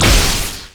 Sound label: deathray; LoL; viktor